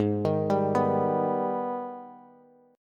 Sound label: Ab Minor Major 7th, down, Bass; arpeggiated; AbmM7